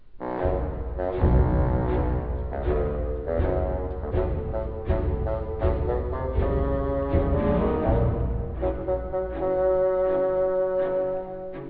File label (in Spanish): FAGOT